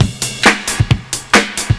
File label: PERCS, 70 s Breakbeats